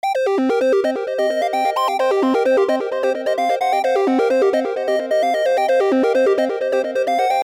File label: minimal